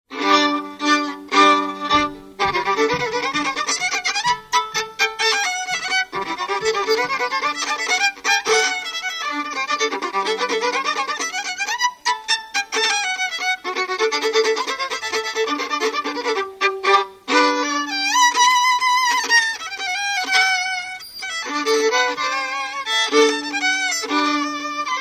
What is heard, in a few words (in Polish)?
dudka polka